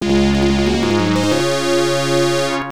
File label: Battle Won; cobra mission panic in cobra city; ibm pc at